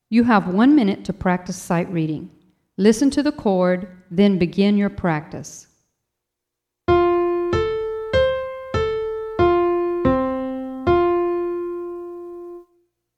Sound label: Soprano F Major